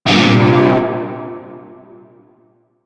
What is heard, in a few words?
Shawzin Blues Chord; Warframe; Narmer Shawzin